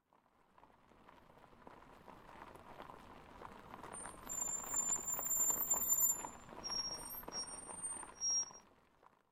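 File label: ambience dirt car stop; Blind Drive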